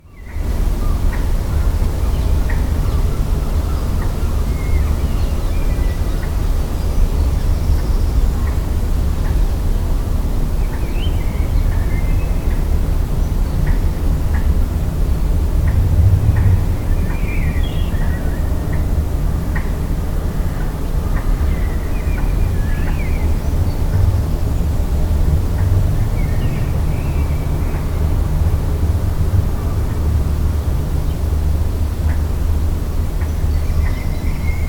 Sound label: Wind